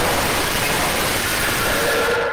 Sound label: jumpscare sound